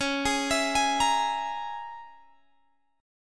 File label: Db Minor 6th Db down Guitar Open G